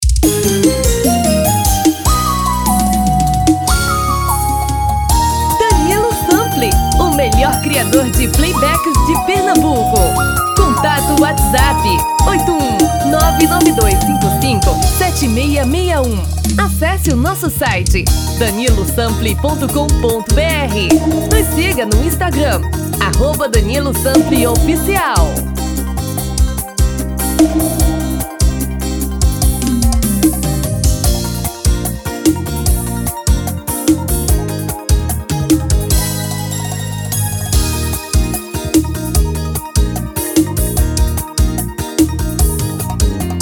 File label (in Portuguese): Brega